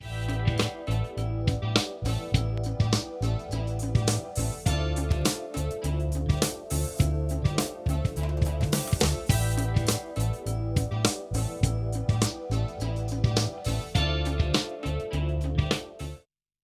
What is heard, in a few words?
EQP High Boost Sharp Q Mono SWEEP